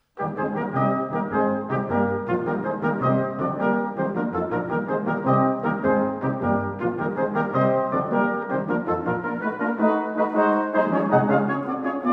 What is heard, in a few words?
Brass Sextet In E Flat Major, Scherzo Allegro vivace